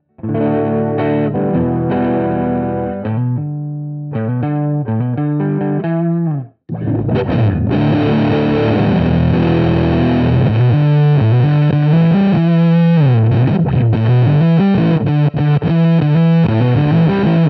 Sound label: dead slow fuzz